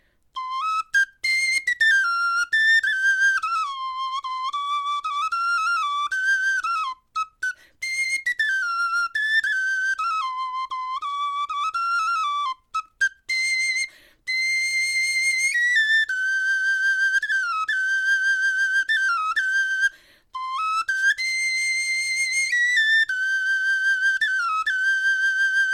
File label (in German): melodie, knochenfloeten